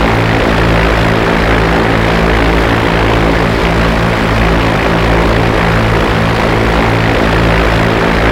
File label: Aircrafts Engines Mi8 Tail Rotor Side; DCS